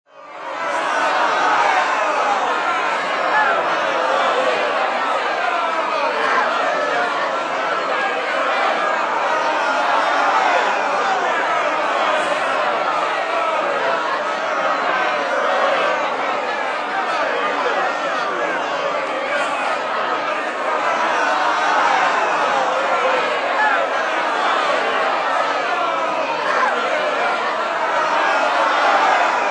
Crowd Angry Mob Protesting, People protesting, shouting, displeased.; Angry riot crowd, or panic in the city; Crowd Sound Effects